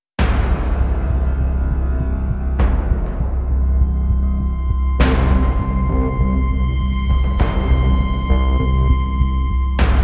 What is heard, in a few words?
No Piano